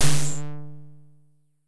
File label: smasher; dos lem